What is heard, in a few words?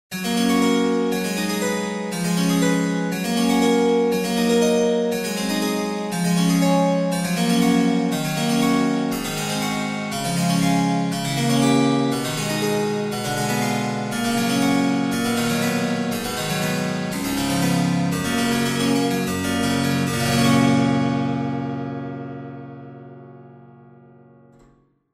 Temperament C